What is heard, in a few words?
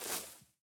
bush small, walking, plant; Factorio